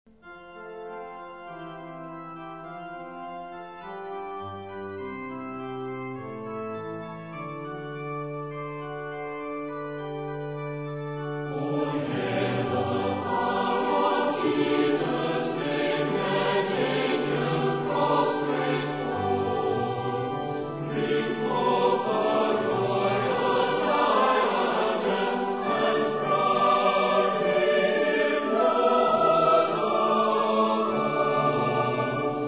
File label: Orchestra and Choir